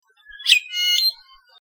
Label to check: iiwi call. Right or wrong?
Right